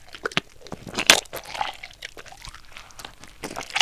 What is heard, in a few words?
CRUNCH, CARTOON